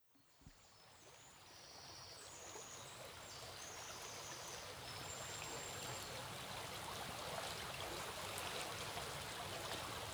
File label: Sansula and river. Recorded early morning; Recorded early morning by the river